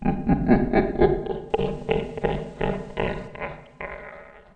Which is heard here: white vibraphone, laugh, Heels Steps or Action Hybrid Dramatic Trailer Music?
laugh